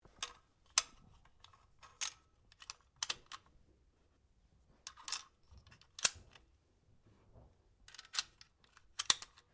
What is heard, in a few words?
RIFLE REMMINGTON700 7.62MM LOAD ROUNDS; A selection of domesttic firearms; Rifles; Guns